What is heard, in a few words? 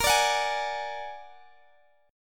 A sharp Augmented 7th, Guitar Open D